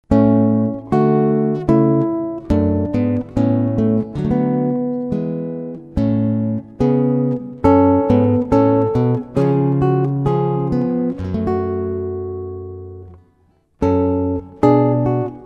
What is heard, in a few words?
Chorale